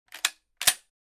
Benelli M4 pump series

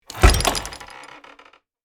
Wooden chest box treasure opening

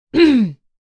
Adult female clearing throat

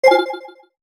Tech alert tone digital futuristic

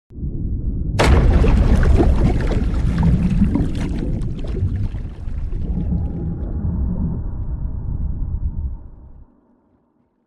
Naval underwater missile launch shot; ship

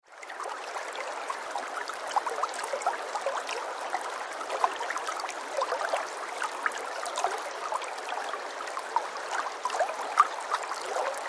Calm small river flowing close perspective; Nature

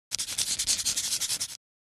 Shoji paper handwriting pen scribble

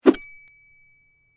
Bright whoosh and ding

